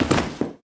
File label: Bodyfall Tile; SFX Kit; Bodyfalls